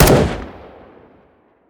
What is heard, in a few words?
M4a1